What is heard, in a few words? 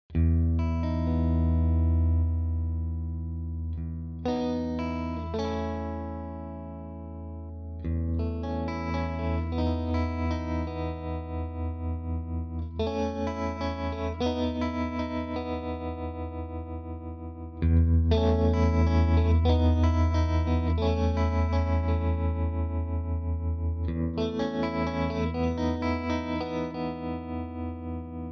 Tremolo Autopan